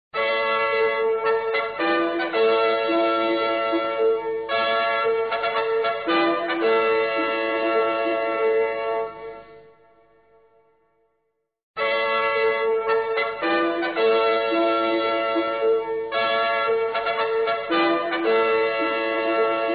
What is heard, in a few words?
Radio Budapest Interval Signal